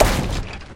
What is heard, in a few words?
cached fireball; 祖玛